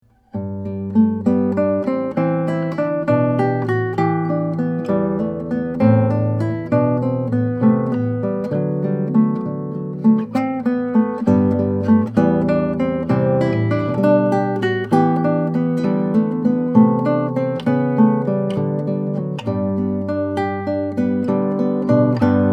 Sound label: Guitar